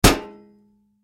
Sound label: Bullet Impact Metal Gun Fire Hit; Combat; Warfare; Blastwave